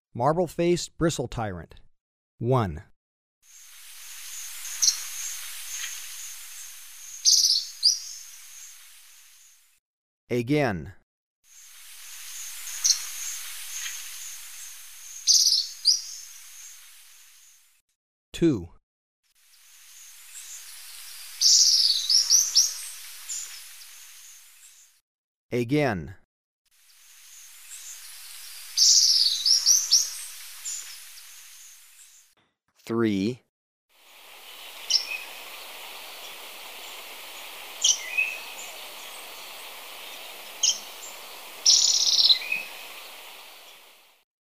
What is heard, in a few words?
Marble-faced Bristle-Tyrant; S. America